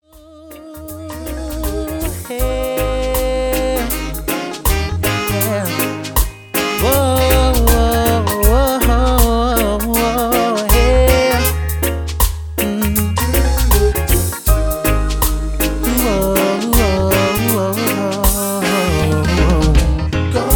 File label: Horns